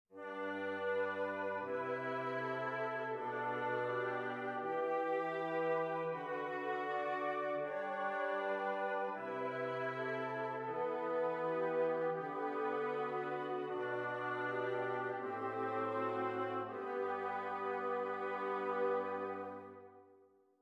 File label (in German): Stimmiger Contrapunkt; Ronge